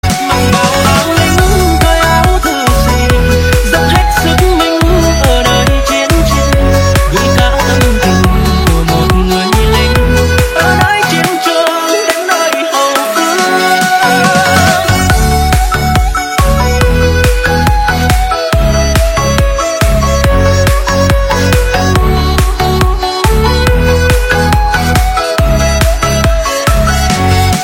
nhac chuong remix

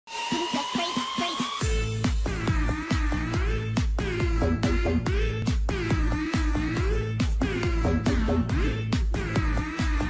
nhac chuong nhac nen

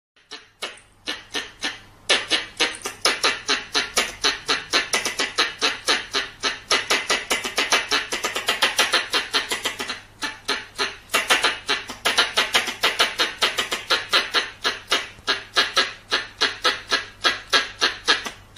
Tieng Soc Bong keu

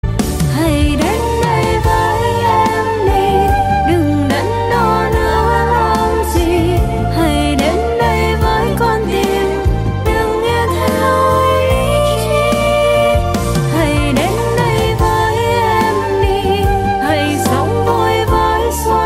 nhac chuong

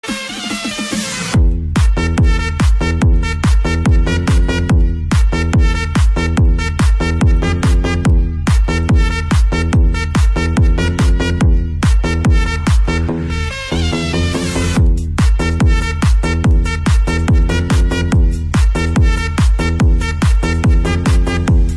nhac bien hinh thanh soc chuot

